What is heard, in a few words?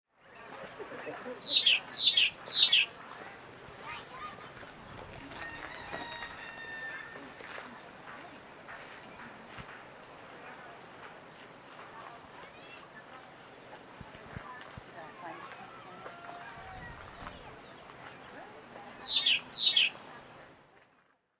medium ground finch